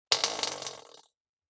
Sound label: Fart Noise; SoundsCrate